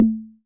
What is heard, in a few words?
Tom, Synthesized; Drums; One Shots; Ghosthack; Drum Mega Bundle; Drum Hero 5; Alloy